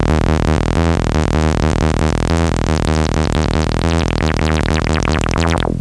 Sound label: tb3 acid